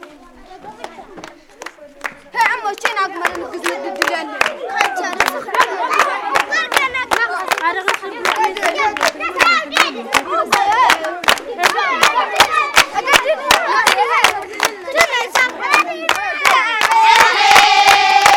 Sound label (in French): chant danse enfants, touareg camp, North Niger